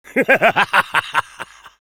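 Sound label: laugh happy, French; Engineer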